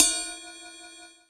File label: Ride Cymbal